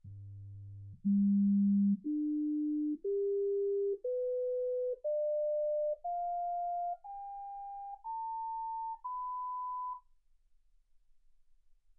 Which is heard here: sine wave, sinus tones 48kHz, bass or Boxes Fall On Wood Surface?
sinus tones 48kHz